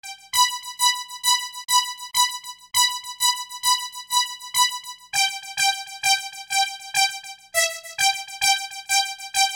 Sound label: Keyboard